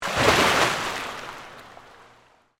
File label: Pool Indoor Splash, Water; Pools; Backgrounds; BlastWave